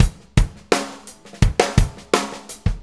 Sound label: Fonky, Drum Tools, Loops